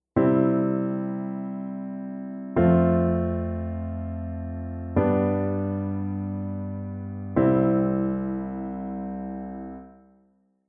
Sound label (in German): Kadenzen Einfach; einfache Kadenz auch Vollkadenz